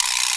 VibraSlap; Digital Sound Factory

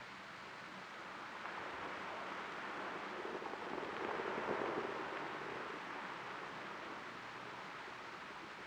Beach Light, Water

